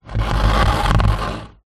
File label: plastic washing basket tub drag on wooden floor; foley